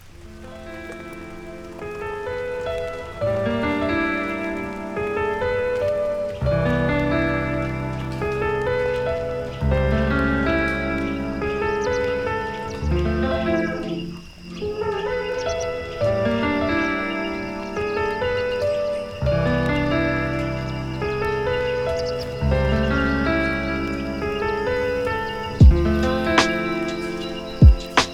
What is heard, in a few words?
Lofi Birds, Nature Sounds, Relaxing Music